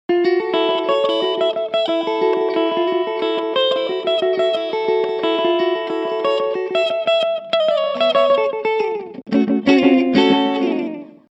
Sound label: slapback